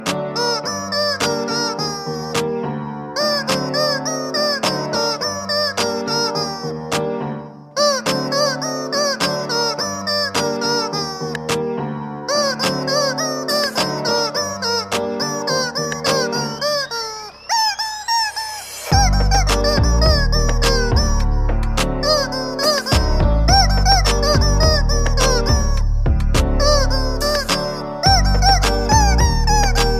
chicken cover

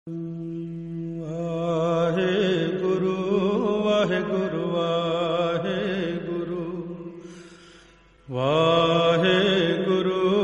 waheguru ji waheguru bhakti

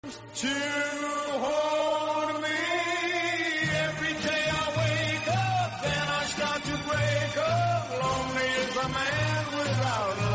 bgm instrumental; moon knight

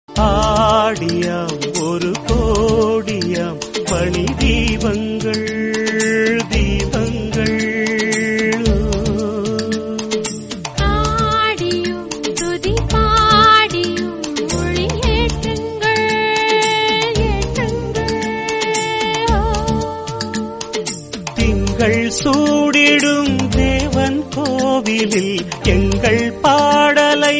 Tamil

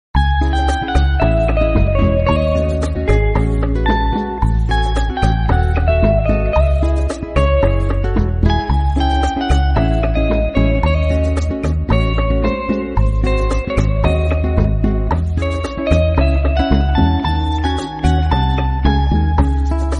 theme instrumental bgm

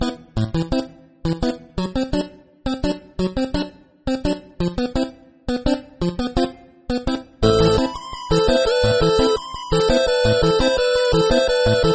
synthesized nostalgia ballads

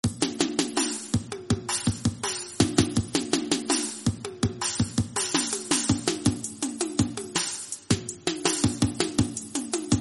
drum beat

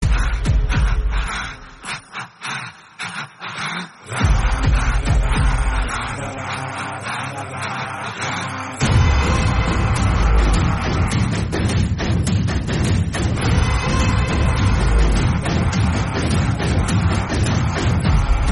interval bgm instrumental; pushpa

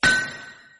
anvil

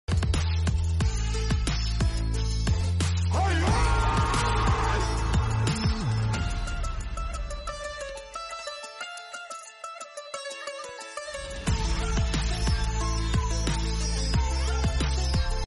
instrumental bgm; Dharam Veer

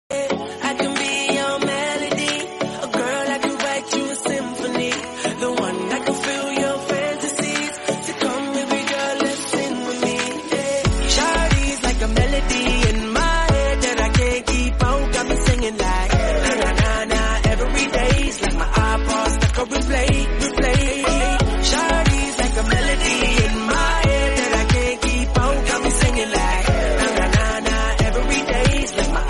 melody

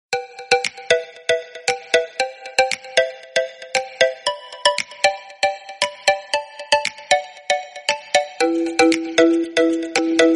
background music telugu; beast

